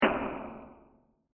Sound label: noiz synth, sfx drumkit